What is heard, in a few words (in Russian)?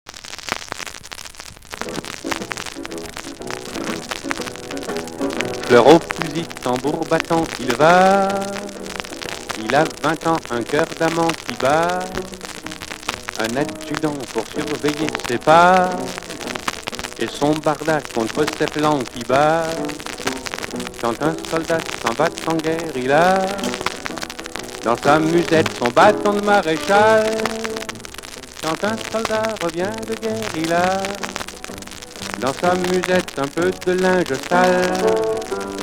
Иностранная эстрада 50-90